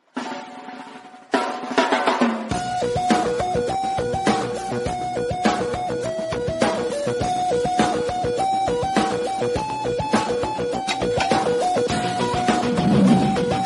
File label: Background Music